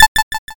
toggle off

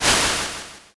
swoosh

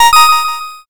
notify

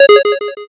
error

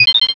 sparkle

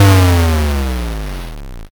explosion l

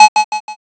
tap

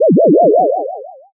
teleport

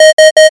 alarm

